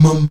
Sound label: VOX MUM D#3E, Afro Chants, Stacc Mums; Roland LCDP11 Africa VOL-1